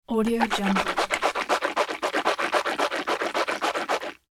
human rinsing mouth; sound ideas

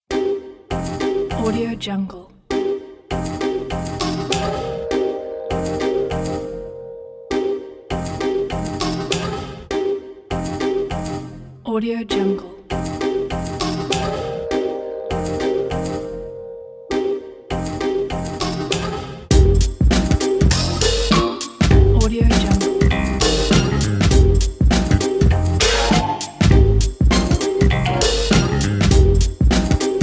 glitch experimental

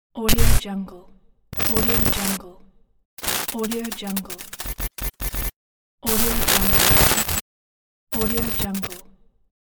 digital glitch

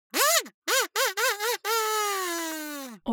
cartoon vocal kazoo laughter

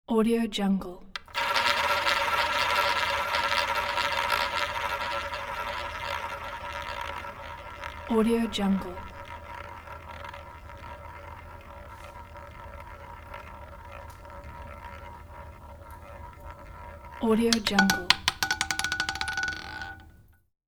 roulette wheel spinning

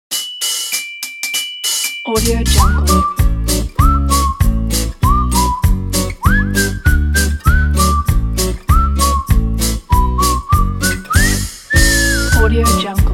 children

